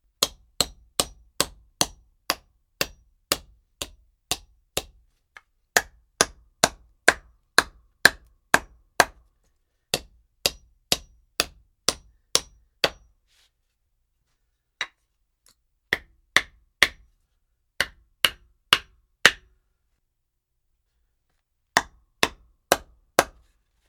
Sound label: hammering